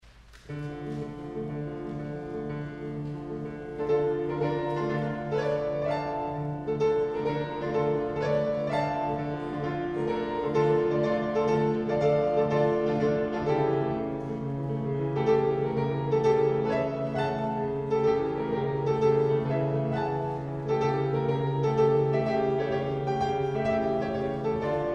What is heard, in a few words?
Solo Chorus